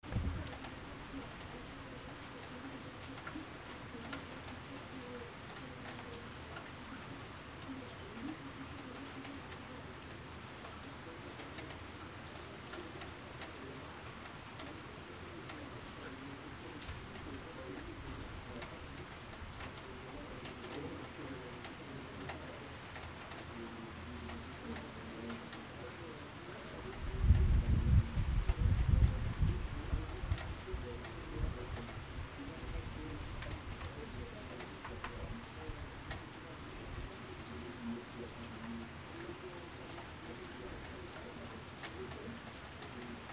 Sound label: Rain at the Balcony With TV in the Background Lyon